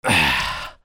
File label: PEOPLE